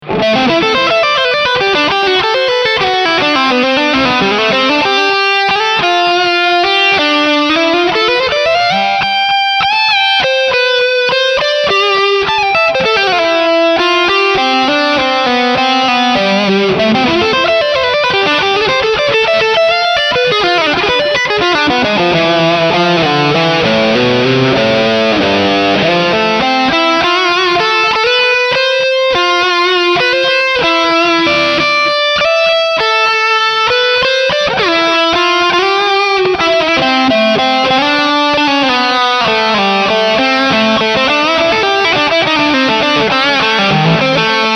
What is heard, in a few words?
Joyo GEM BOX III Preset Pop Drive